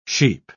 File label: sheep